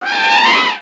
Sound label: elephant